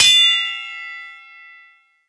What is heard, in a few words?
hitsound default; cstrike; tf2